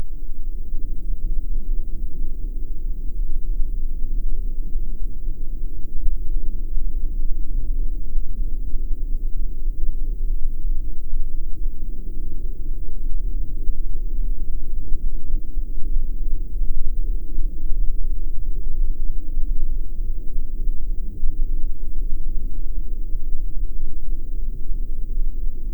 subtle warmth hum ambient; lapping

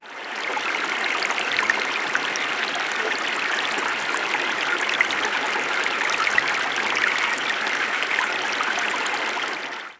a calm and soothing river; relaxation

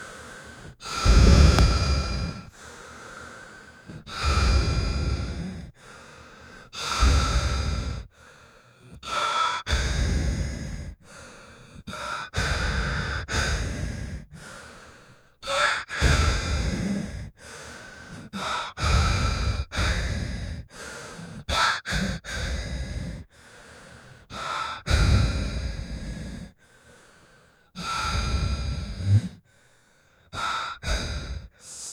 years old man groaning, sick

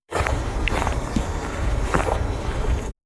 cozy nostalgic, ocean waves

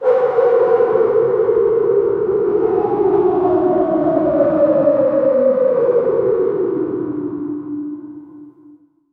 despair, melancholy

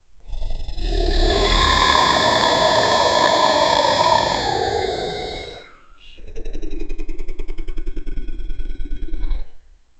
dragon roar, beast

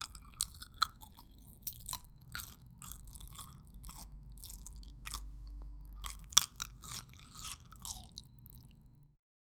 biting fresh bread softly

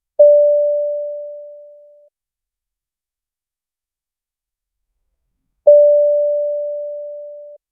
single phone notification, ping

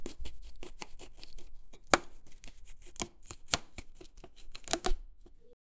the swift slicing and dic, chopping

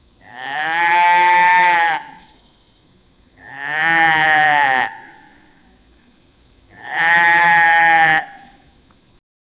cow sound

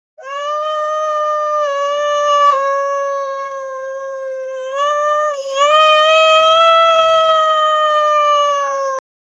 cow call

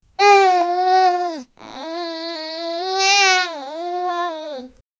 a giggling baby says mama

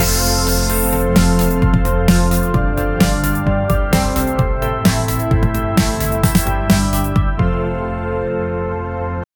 intro for logo, background music

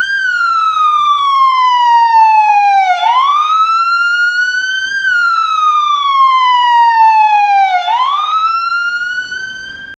police sirens wailing

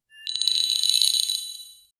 magical crystal style eff

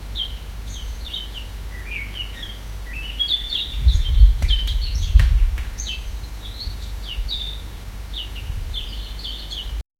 realistic ambient morning, cottage

